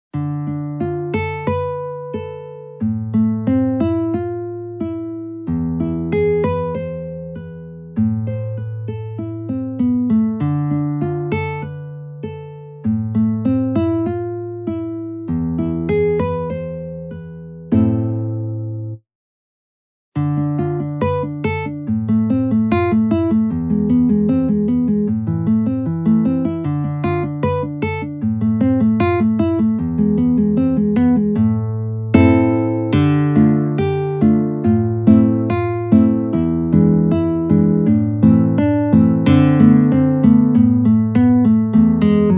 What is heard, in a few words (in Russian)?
Vengerka ciganskaya gitara semi